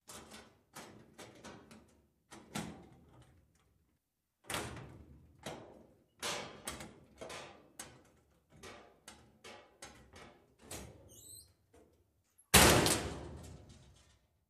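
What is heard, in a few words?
Locker open close W Slam, Medium Distant, Interior Pov W Squeak And Rattle.; lockers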